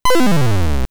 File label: swallow